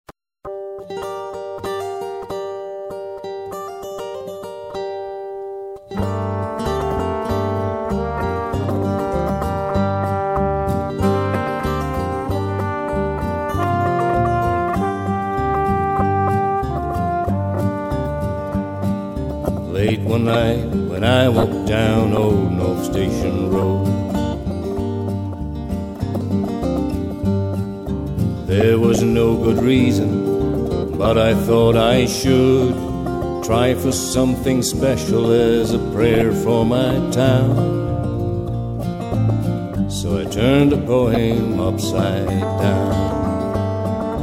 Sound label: a stone washed chorus